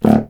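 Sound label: wind contrabassoon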